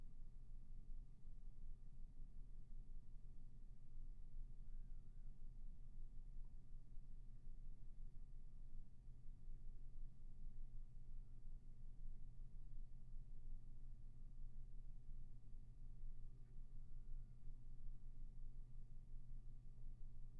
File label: roomtone